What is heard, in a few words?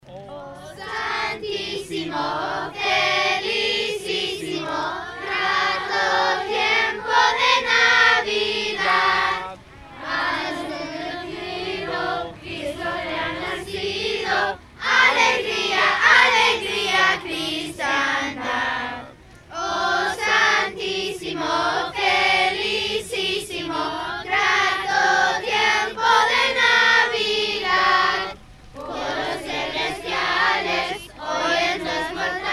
Spanish Immersion Choir Sings; Big Red Radio